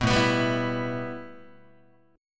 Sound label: A 7th Suspended 2nd Guitar; A7sus2 strummed